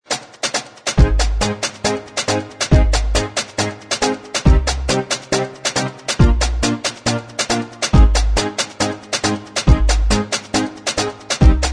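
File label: instrumental track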